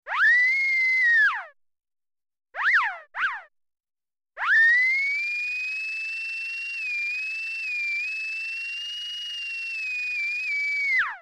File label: dental drill